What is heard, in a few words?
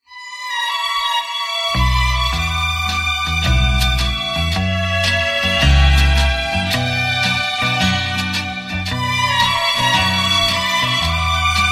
ui pause lp; Soviet pause